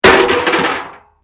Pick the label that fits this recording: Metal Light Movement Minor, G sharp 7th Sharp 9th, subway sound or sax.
Metal Light Movement Minor